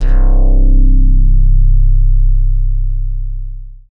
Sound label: dance bass; Sound & Vision - Gigapack II CD 1